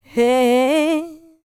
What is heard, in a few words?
LICKS, Vocal Planet, R&B; ILIO; Dance; LT R+B